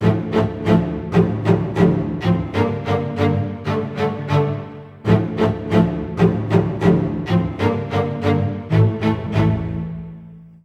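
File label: D minor, Low Strings, 90; PowerFX - Downtown Orchestra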